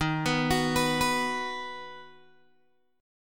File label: D sharp Minor Sharp 5th D sharp down Guitar DADGAD